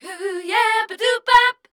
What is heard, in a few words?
MELODIC #F, Voice